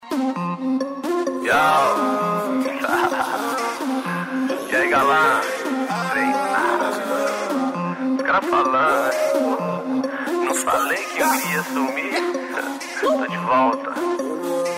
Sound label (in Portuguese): funk e rap